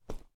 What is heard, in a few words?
Snow